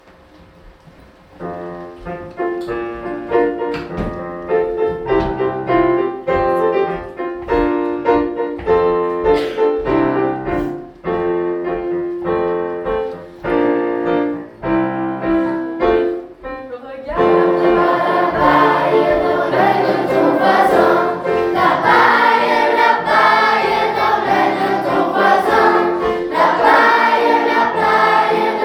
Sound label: enfants; chants